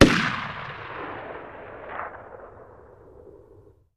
Gun Shot Sngl Ext

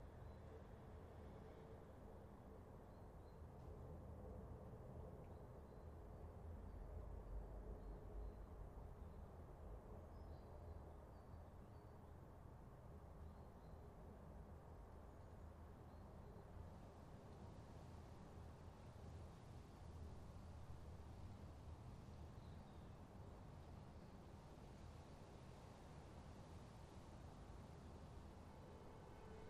Rt Room Tone Quiet City, air fill

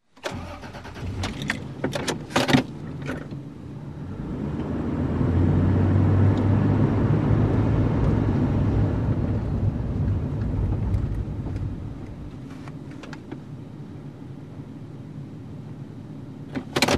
SUV Engine Accele

